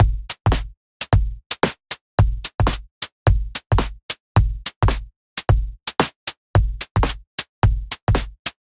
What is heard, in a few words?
Beat Washer, 110